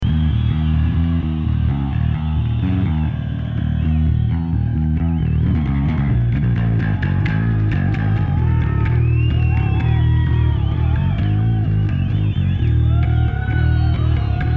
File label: Bass Solo